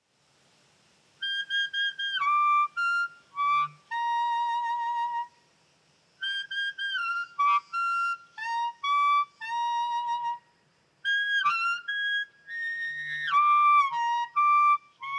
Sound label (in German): Frei Oberton Spiel Grillen